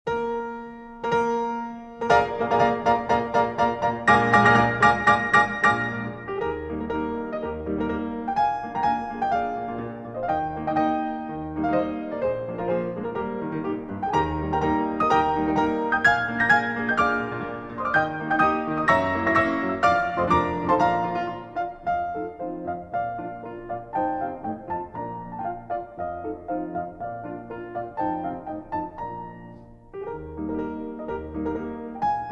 Marches Militaires, E-Flat Major. Allegro Moderato